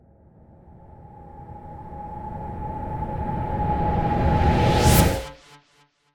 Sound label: vomit warning; casualtiesunknown